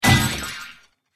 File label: metal hit